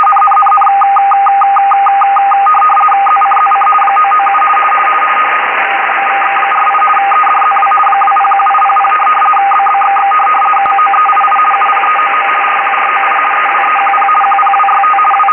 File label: 11038 USB